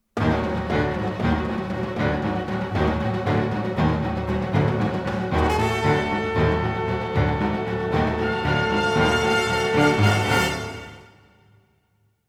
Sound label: suspence short; Chrome